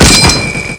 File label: garand reload clip ding